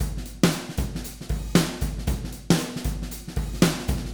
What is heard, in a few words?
ezdrummer ensemble; toontrack testbericht